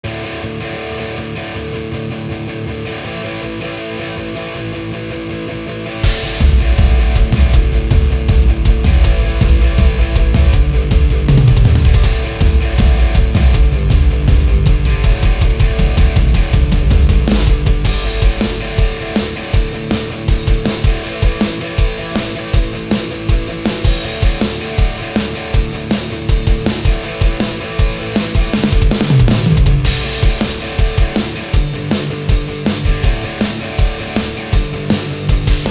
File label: lick